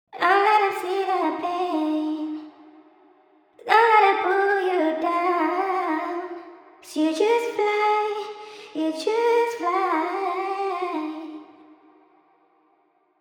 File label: Vocal Loop 137 BPM C Min; Just Fly; Cymatics; ANALOG EVOLUTION Beta